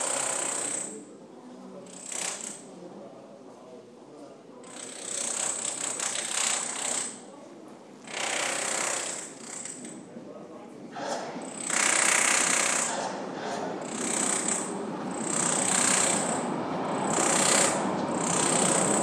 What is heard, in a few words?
machine sound